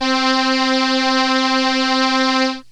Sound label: Solina Trumpet C3, analog synths; Ableton Foundation FreePacks